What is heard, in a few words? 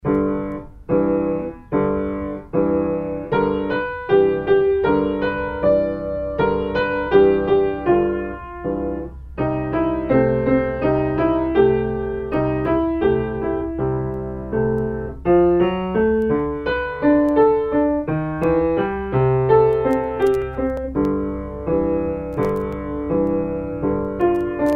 harmonic blues, Pianist; Level 2; Bastien